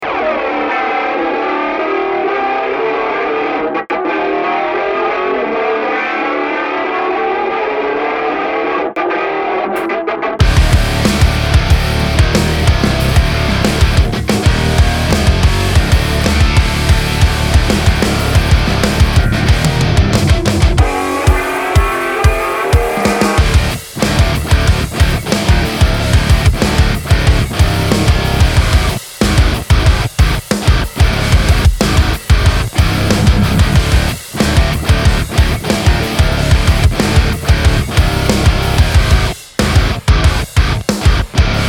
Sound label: Modern Metal